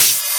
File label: Open HH, Cymbals